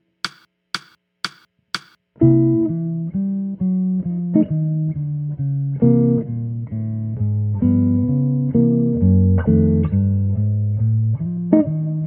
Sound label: Bop Blues Stroll, guitar